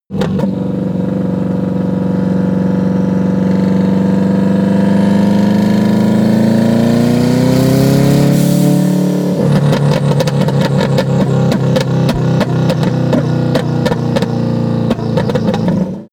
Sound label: car vw t1 accelerate decelerate